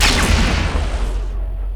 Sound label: laser